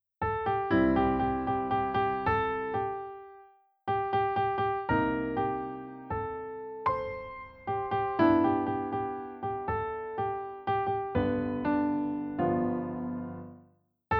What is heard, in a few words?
accompaniment